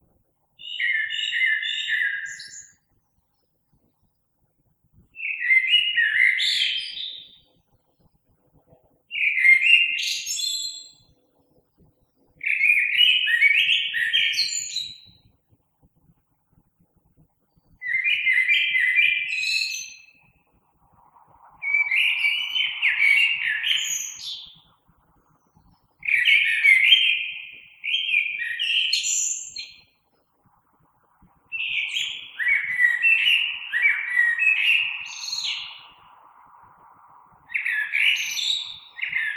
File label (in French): Merle